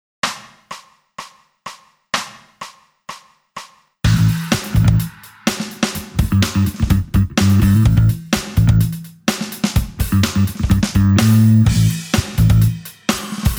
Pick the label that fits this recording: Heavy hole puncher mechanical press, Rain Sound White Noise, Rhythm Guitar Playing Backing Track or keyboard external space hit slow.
Rhythm Guitar Playing Backing Track